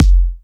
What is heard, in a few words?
Electro Kicks; Bassdrums; Vengeance - Essential Clubsounds Vol.5